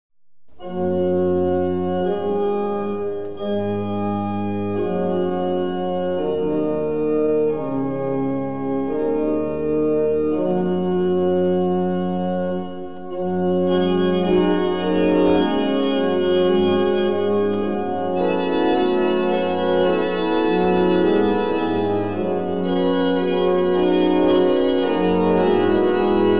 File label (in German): Choralvorspiel; Biener; Nun bitten wir den Heiligen Geist